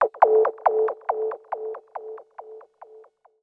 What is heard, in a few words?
KEY SHOT TEKNO 140 A; Techno2; Singleshots